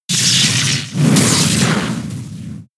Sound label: Spark; pokemon sfx gen 6 attack moves xy oras